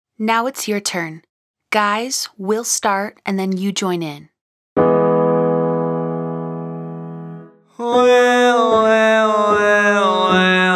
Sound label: Wah Wah Wah Descending 5 Note Scale Male Version